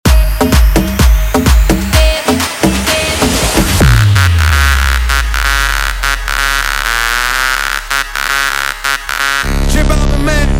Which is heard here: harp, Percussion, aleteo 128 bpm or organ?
aleteo 128 bpm